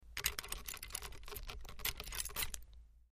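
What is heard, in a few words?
Club Lock Unlocking, wheel lock; The Club Lock; Unlocking A Steering Wheel Lock.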